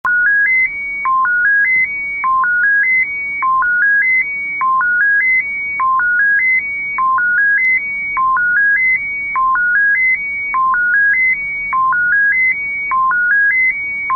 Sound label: 5FSK